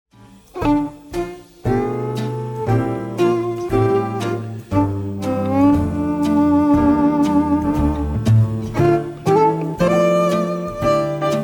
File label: Violin Piano guitar Bass Drums